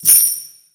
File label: Pickup Coins; Pickups